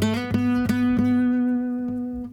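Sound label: guitar; Zero G; Man Machine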